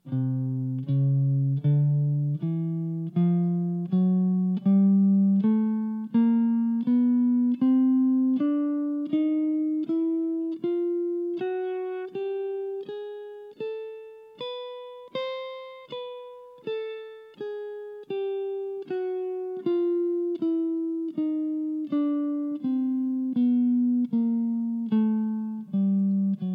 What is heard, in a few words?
C Lydian Chromatic, guitar